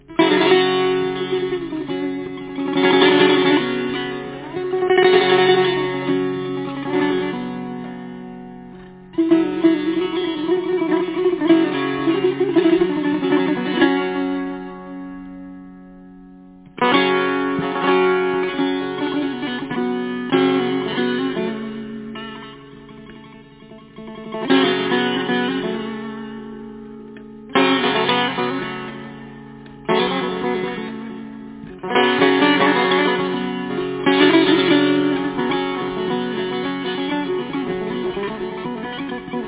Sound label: setar, RingTone